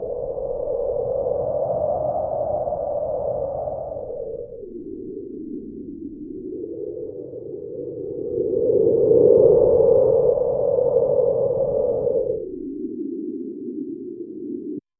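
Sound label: windy icy plains of iceland, cold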